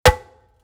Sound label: Impacts SIMPLE; SoundBits